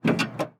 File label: Auto Police Car Open Hood; Transportation